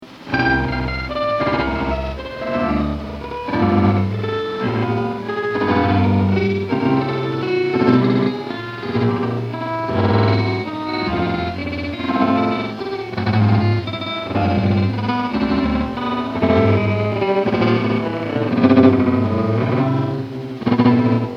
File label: triplets quarter speed